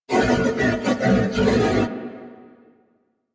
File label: Horse Trot Breath; Vocals; Warframe; Vehicles; Duviri